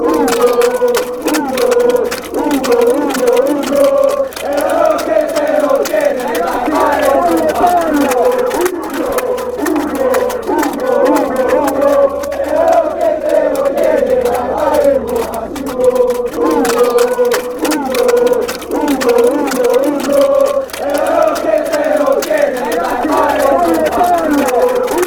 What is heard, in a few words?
a goal, fan chants